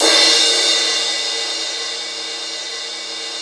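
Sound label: 18-inch crash B; InVision Interactive; Lightware VOL-1; Instruments & Percussions; CYMBALS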